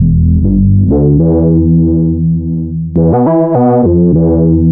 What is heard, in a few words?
Moog Bass; Sonic Foundry (Sony Creative Software) - Rondo Brothers Trip-Hop Loops & Samples; Liberation